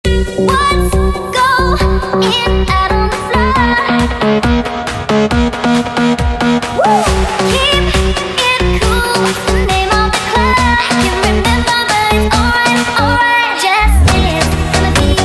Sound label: Funk sped Up